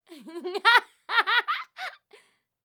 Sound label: female laugh; prosoundfx